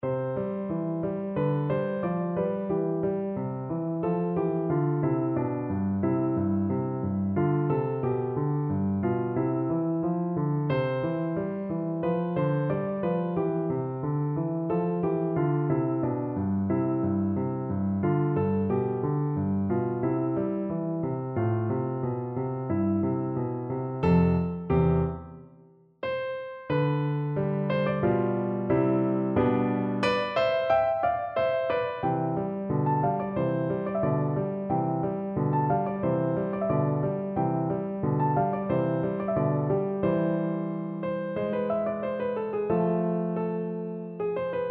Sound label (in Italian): non mi dir, piano